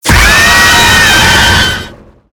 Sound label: Jump scare